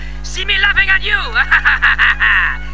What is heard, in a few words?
laughing